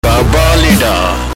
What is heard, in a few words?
SMS Tone